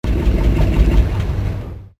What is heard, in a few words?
Car Shuts Off